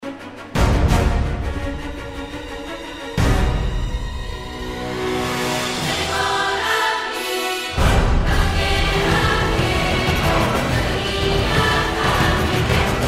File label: Solo